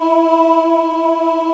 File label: Choir; East West - Global Sounds